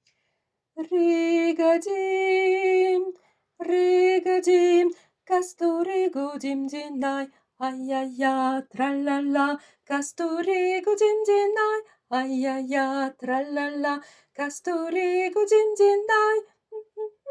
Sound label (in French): alto